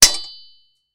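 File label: Sword Clanks Single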